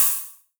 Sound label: Ride; Cymatics; Dubstep Starter Pack; Drums - One Shots; Cymbals